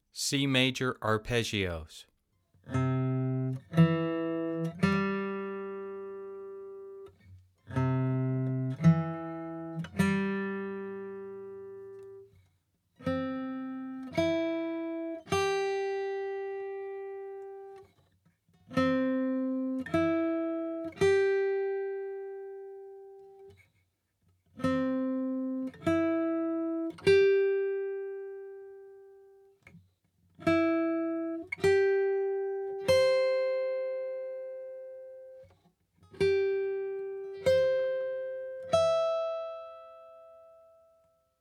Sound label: C Major Arpeggios